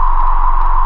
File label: shoot; VIDEO GAMES; pulsar